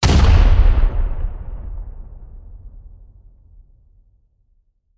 finger cast; weapons; dota; lion

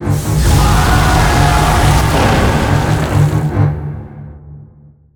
shark ship; weapons; dota; kunkka

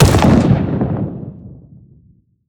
stike impact; weapons; dota; monkey king

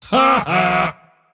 mars laugh; dota

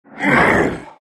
shards pain; terrorblade

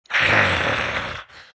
moans; Undying

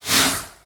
melee rip; terrorblade

